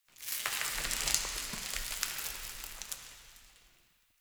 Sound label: Small Dust Rock Slides One Off; Nineteen Ninety Nine